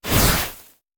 Fuse Black Powder; Sound Ideas; Guns

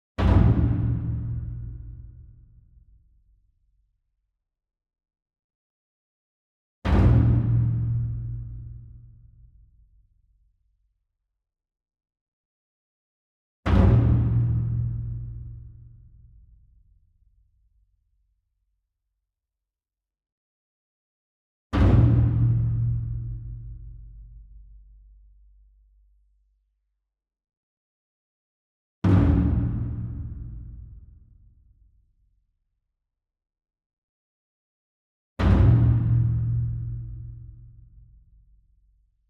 ENSEMBLE DRUM Taiko Flam Far; boom library; Cinematic Strikes Construction Kit

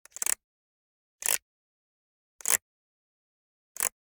Generic Click Plastic Small

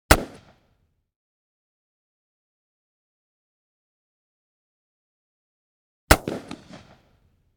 12 Gauge Shotgun Blast Medium; Hollywood Edge; Heavily Armed

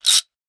Gadget, Motors & Switches; 1001 Sound Effects

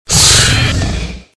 Velociraptor - Hiss and Growl; RadwinIsland

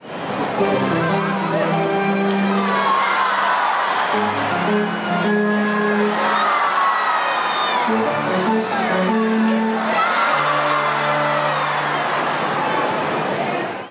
Baseball Crowd Charge; Crowds

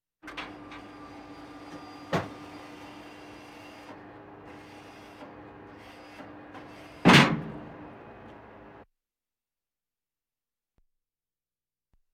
Military vehicles

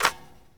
Street Ball Nylon Swish; SFX Kit

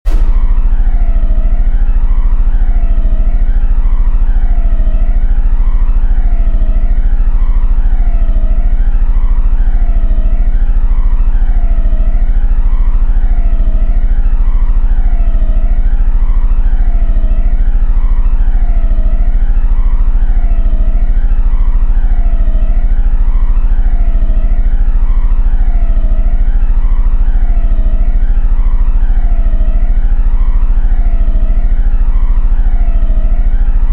Low Machine; RoosterFX; Drones

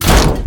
hit cyclers; Blind Drive

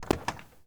Mustang GT350 Door Open; Automobiles

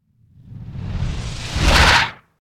Impact Whoosh Strong Air Whoosh; Hollywood Edge; sound designer's toolkit v2

